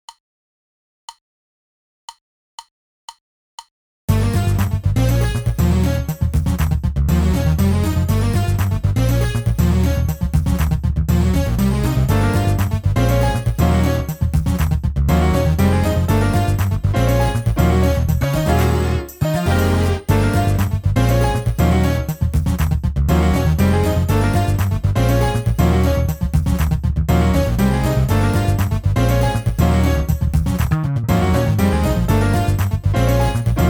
Spicy Fusion drumless

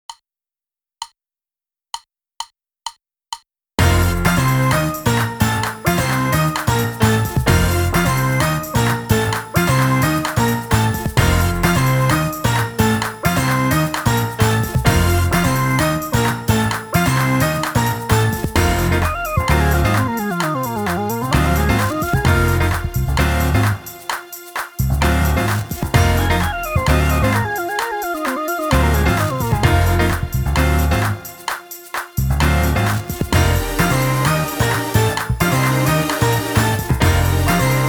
Fusion Groove Loop drumless

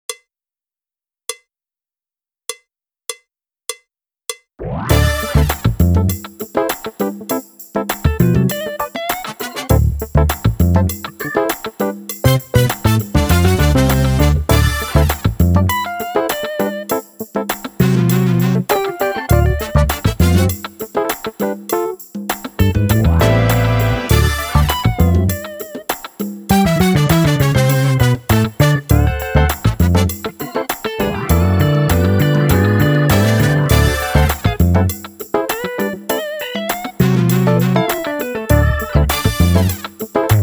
Funk drumless

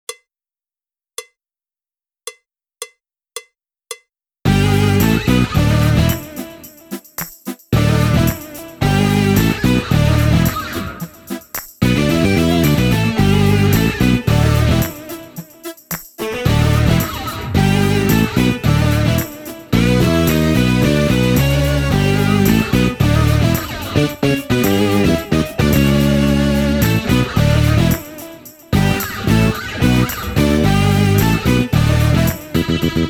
Funk Rock drumless

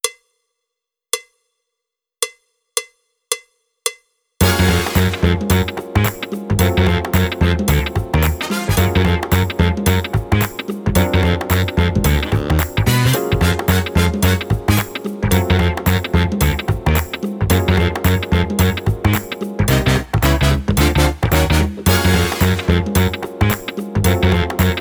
Funk Groove Loop drumless

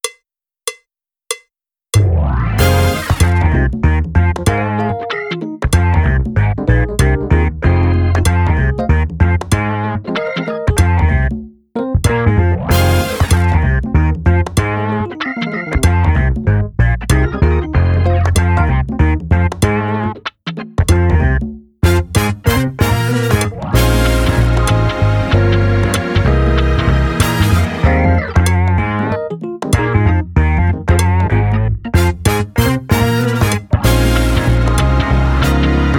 Kick Back Funk drumless

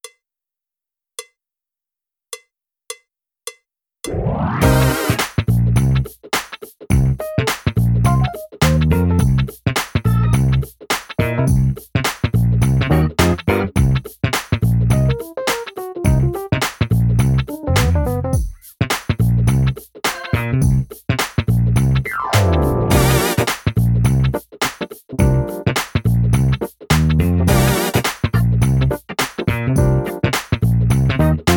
Dig That Bass drumless

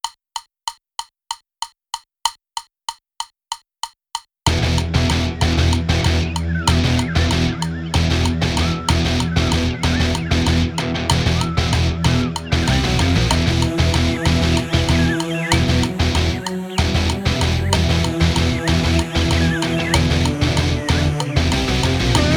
Metal drumless

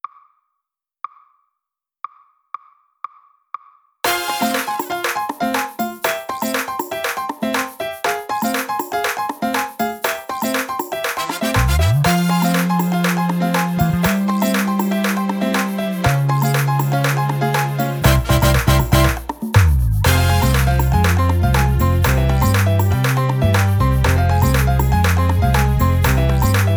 Latin Caribbean Shuffle drumless

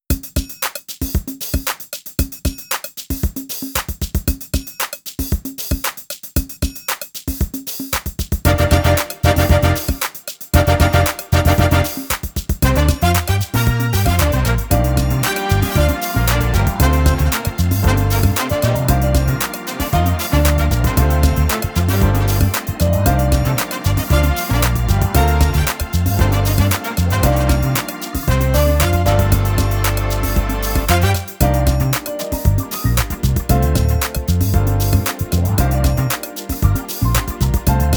drumless

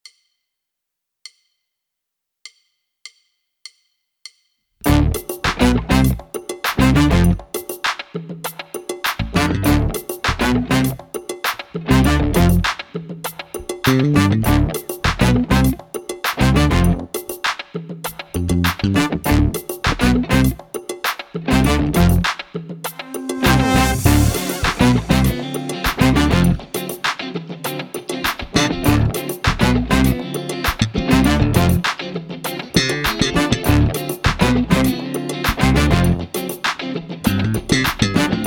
Funky Story drumless

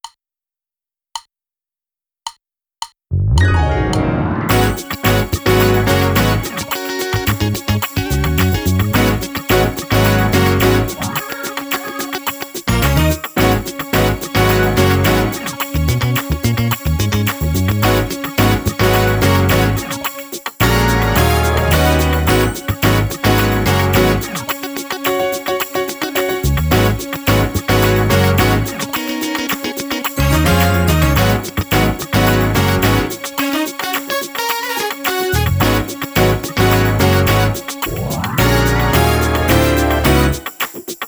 drumless

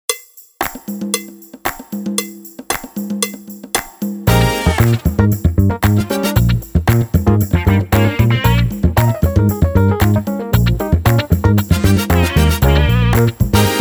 Funk drumless

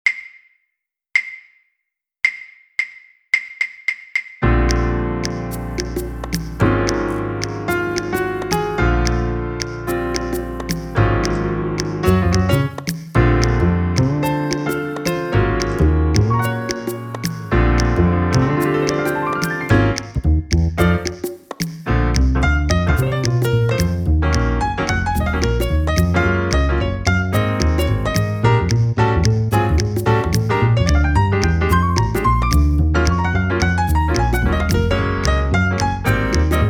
Swing drumless

